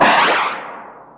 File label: Electro FX; Ueb HE Electro FX